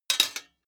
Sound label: sword sabre guard parry soft